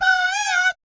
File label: BAYEEAT, Vocal Planet Jazz Fx; Spectrasonics